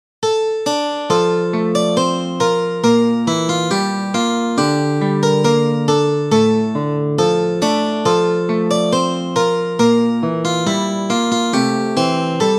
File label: guitar; 主耶穌點解愛我